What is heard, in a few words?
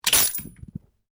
RIFLE REMMINGTON700 7.62MM UNLOAD ROUNDS; A selection of domesttic firearms; Rifles; Guns